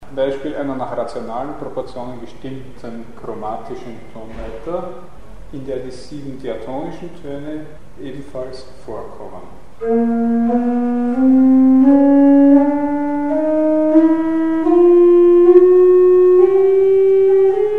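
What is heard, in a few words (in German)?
Siebenstufige Tonleiter innerhalb der Zwölftonskala; Mikrobuch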